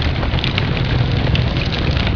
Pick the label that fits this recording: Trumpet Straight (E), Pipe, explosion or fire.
fire